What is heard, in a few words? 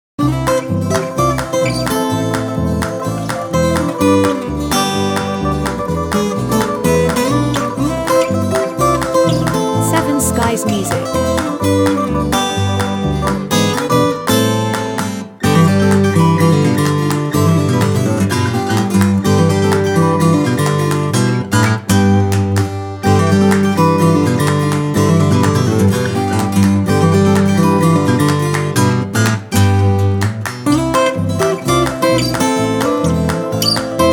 Corporate Country